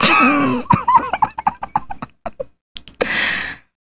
Female Laugh, Hip-Hop and RnB Vocals

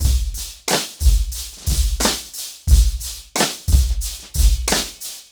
90BPM, acoustic drums; The Roots Drumloops

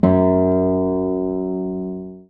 STR HARP, STR Orchestral

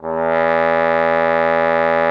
TROMBONE E2; Xsample VOL-3

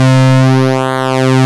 PULSE MOD C2; E-MU Formula 4000 Series Vol. 3; Analog Odyssey

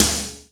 INDUCED SN; Big Fish Audio; Synth City

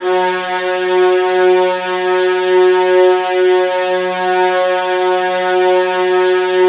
VIOLINS G#, Strings; ILIO; Synclavier Strings

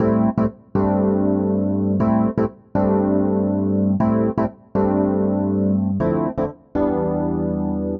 Chord El Piano Am, 120bpm; Zero-G; Extra Chord Progs

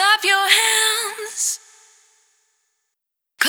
Clap Your Hands; Sonic Foundry (Sony Creative Software); Pocket Diva World Class Vocal Samples

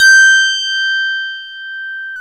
PNO FM, Piano Layer

